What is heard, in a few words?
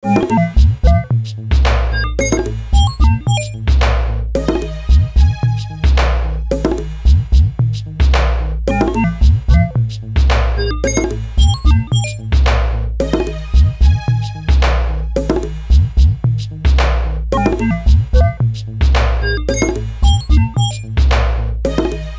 BEAT GLITCH, Beat Loop Glitch String Synth Hip Hop Electronica; Miscellaneous Loops; Blastwave